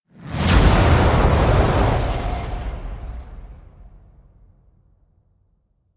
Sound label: destruction slams twisted metal with whoosh